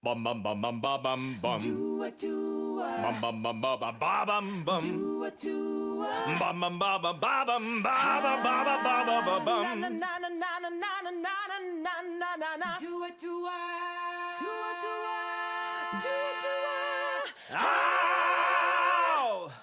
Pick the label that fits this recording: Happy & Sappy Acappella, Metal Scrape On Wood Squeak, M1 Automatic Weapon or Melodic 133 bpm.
Happy & Sappy Acappella